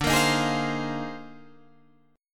A sharp 11th

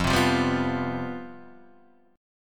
C Major 7th Suspended 4th, F, guitar chord

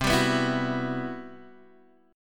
C Major Sharp 11th, Guitar Open G

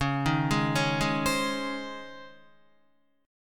Db Major 7th Suspended 2nd Db Guitar; DbM7sus2 arpeggiated; JGuitar

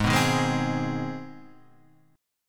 C Minor Major 7th Sharp 5th Ab, chord

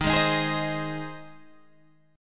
G sharp Major, guitar; 11,11,13,13,13,11

